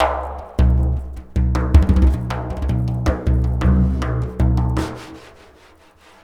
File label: lick, ETHNIC STUFF